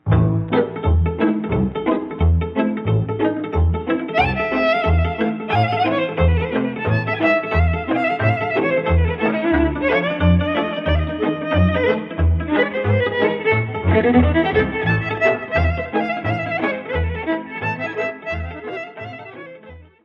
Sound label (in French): Hora lautareasca, Roumanie